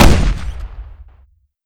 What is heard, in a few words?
thermite shoot, weapons